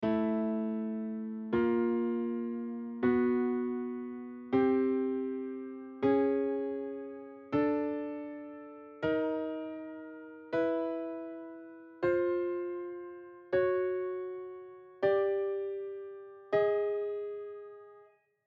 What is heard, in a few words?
All Fifths Meantone; Tunings